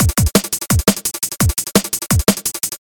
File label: Drum loop